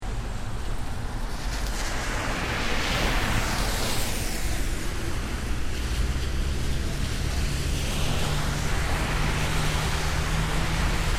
Wet Traffic Intersection Short, City traffic at inters; City traffic at intersection during rain. Very wet surface, raindrops in foregro; Traffic And Car Sound Effects; LynneMusic